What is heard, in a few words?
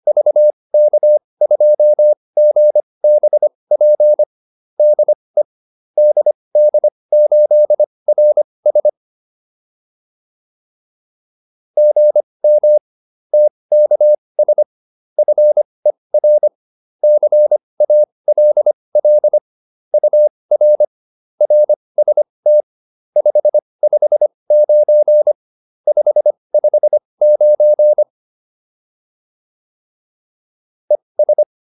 QSO, advanced practice files